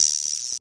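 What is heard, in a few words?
guiro, Roland CR-78 drum machine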